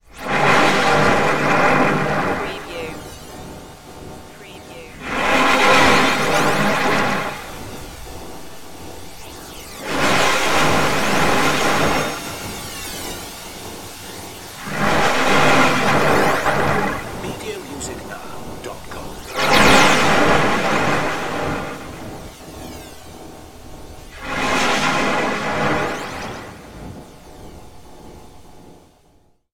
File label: starship engine room